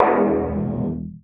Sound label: Bass Bomb; SFX; Ambient - General